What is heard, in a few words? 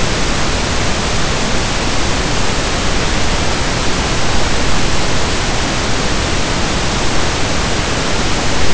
noise